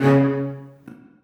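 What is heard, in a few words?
celli staccato c2; Sonatina Symphonic Orchestra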